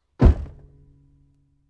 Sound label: Closing Trunk